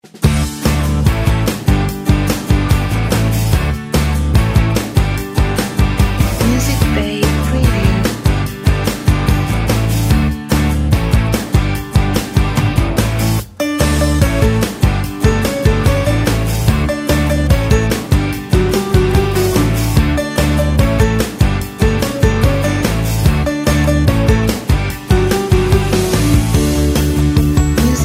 Bright and Positive Pop Rock

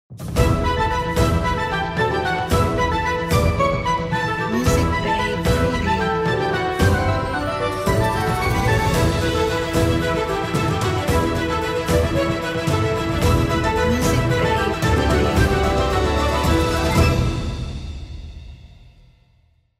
Fantasy Adventure Ident

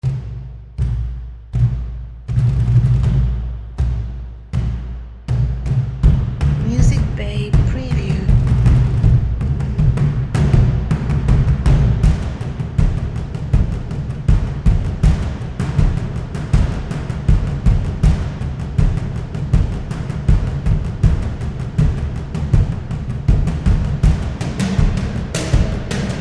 Cinematic Action Chase Drums

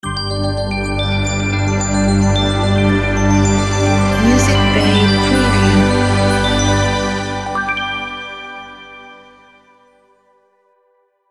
Digital belly logo

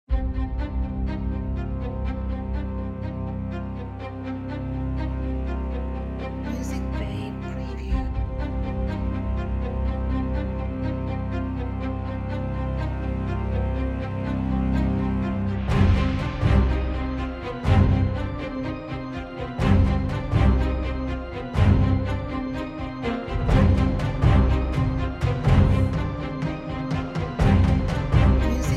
Epic Action Blockbuster Trailer

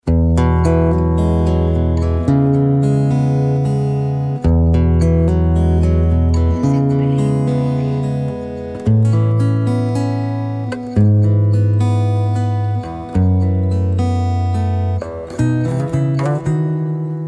Acoustic Guitar And Cello

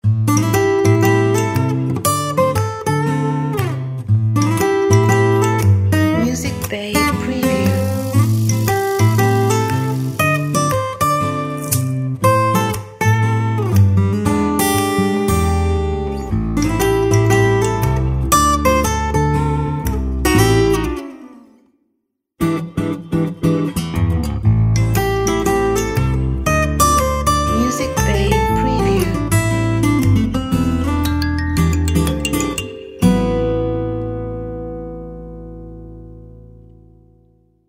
Whiskey Blues

